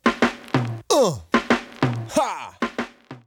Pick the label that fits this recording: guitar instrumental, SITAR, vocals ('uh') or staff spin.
vocals ('uh')